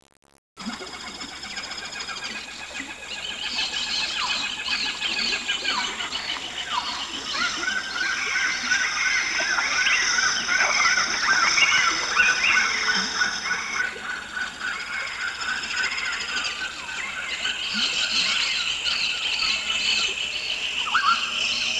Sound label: midday, forest